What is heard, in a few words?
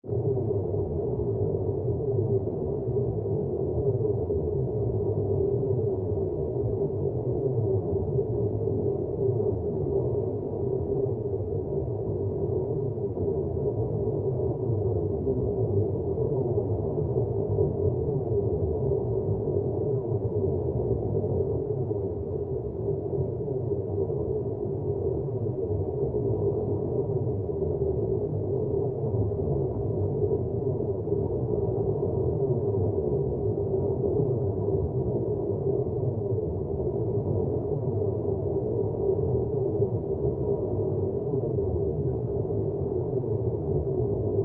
Ambiance Bass Air; drones & tones